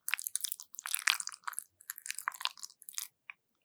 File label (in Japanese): 挿入; ASMR